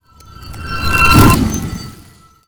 frost cast

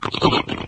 idle nocombat, flood

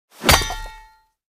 dagger hitwall; weapons

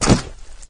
jump up, flood

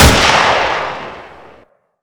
garanb shoot; weapons

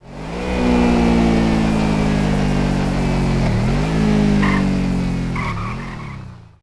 throttle off, vehicles; tdmcars; gtav; adder

